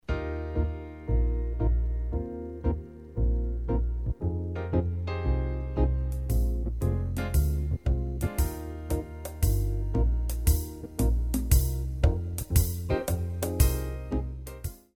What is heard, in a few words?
Foxtrot